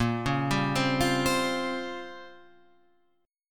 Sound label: A sharp Minor 6th Add 9th A sharp down Guitar Open F, chord; arpeggiated